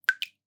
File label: Drips